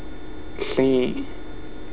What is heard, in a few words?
horse